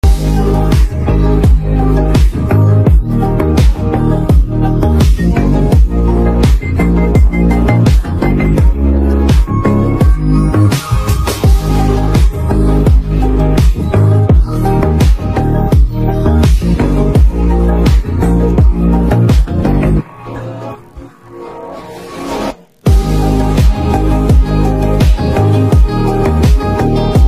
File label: song ringtone music bgm ringtone